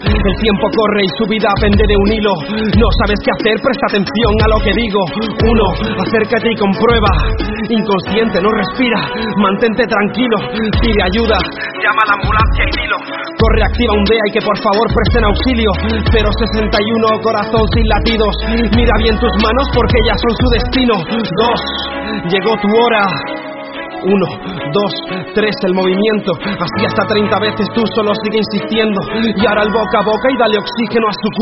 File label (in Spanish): Rap de la RCP y uso de DEA